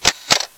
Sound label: Shutter